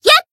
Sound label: attack; radica